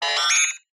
science fiction robot android beep chirp classic

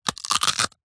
horror bone break snap twist rip hard; zapsplat

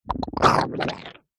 cartoon squelch

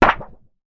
impact metal sheet thin wobble; zapsplat

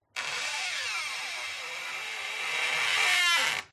Wood Stress Creak Squeaks; DPA4060; pmsfx

